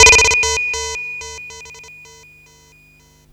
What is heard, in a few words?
FX, Drum & Bass